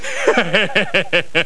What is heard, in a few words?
LAUGH